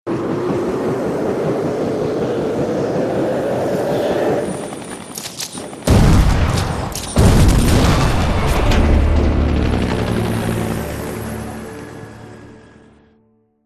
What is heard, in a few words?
Helicopter; The Amazing Spider-Man